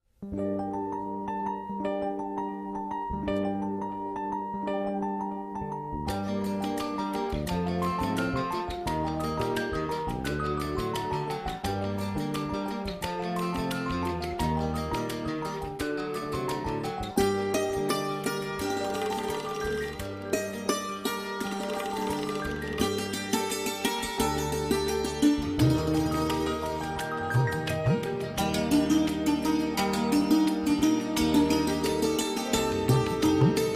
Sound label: rain music